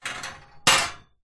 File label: metal frame short sharp movement rattle